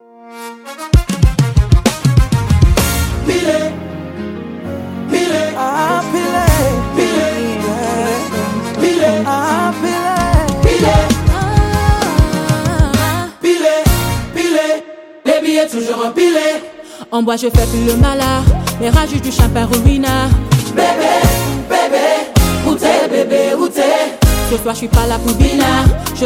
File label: loud urban choir